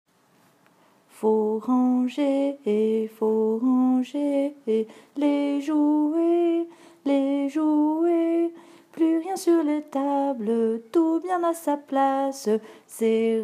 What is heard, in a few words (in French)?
Chanson Pour Ranger